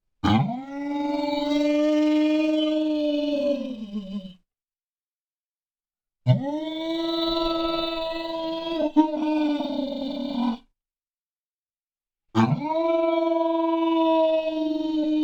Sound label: Werewolf Howls And Growls